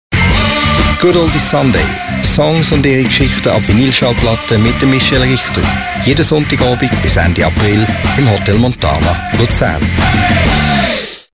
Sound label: Oldies Spot; Montana